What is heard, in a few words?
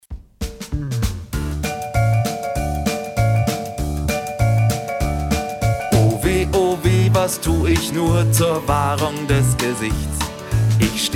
Tenor